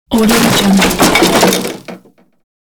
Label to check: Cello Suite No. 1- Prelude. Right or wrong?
Wrong